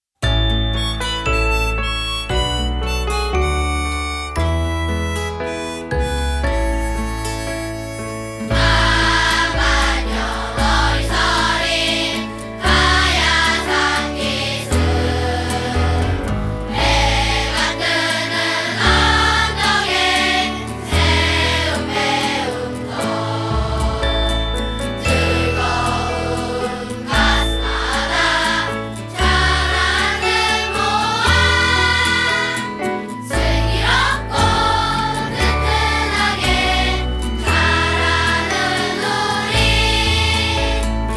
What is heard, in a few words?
school song; gasan